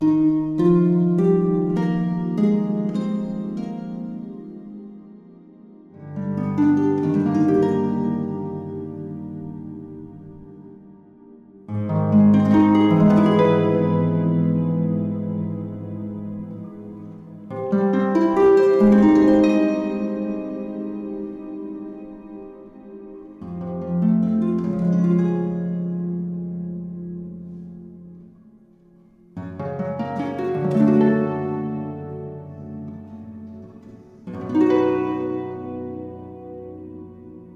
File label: Celestial Harp; World of Warcraft 9 Shadowlands